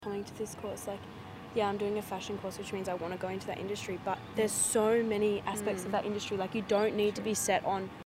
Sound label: chainsaw background noise